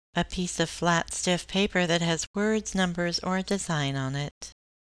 card

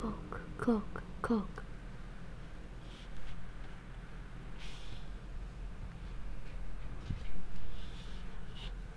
cough

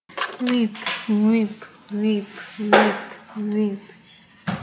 whip

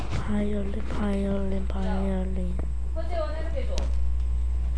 violin

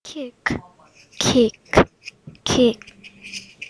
kick

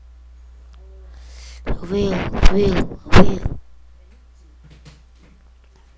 wheel

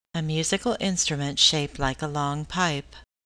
clarinet

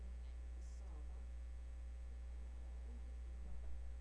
zipper

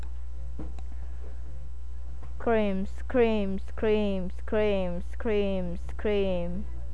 scream

